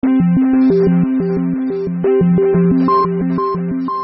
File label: ARP